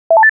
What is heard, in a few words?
keypress